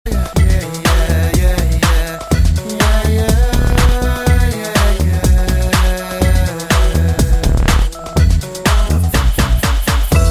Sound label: Music Mix